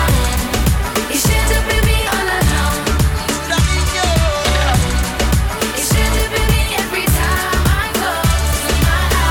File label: funk; multitrax